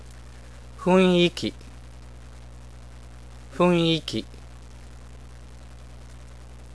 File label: atmosphere